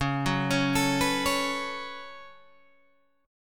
C sharp 7th Suspended 4th Sharp 5th, C sharp, down, Guitar, Open G